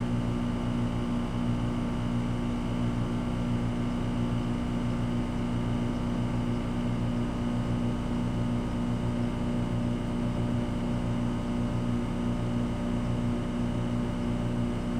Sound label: chemical Reactor; voxelverse